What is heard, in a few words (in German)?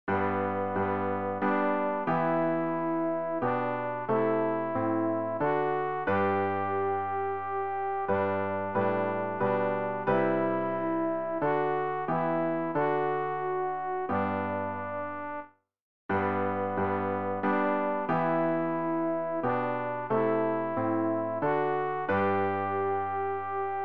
alt, Übehilfen für das Erlernen von Liedern; ref gesangbuch; rg 220 gott in der hoeh sei preis und ehr